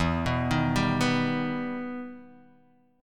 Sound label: E Minor Major 7th E down Guitar DADGAD